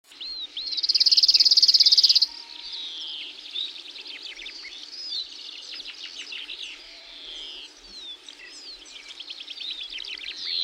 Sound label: cirl bunting